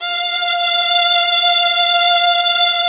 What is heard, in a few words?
TRON 3 VIOS F3; E-MU Formula 4000 Series Vol. 5; Protozoa; Vintage Presets